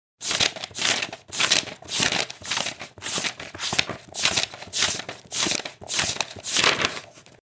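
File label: page turning sound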